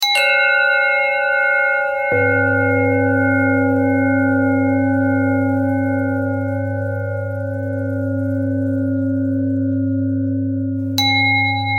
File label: bell thread